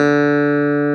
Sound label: CLAV D, CLAV PIANET; Sonic Reality; Vintage Timetraveler